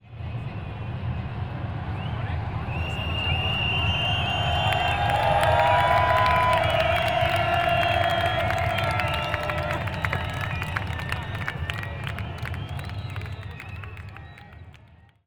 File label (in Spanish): aplausos y gritos en fade in; Field Recordings